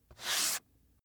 book dust off staged single

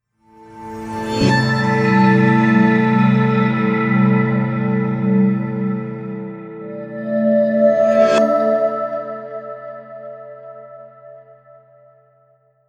promo pad

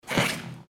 steps on rubber

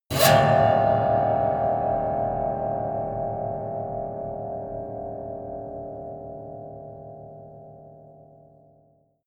piano twang fast squeal

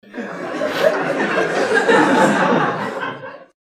gameshow short laughter

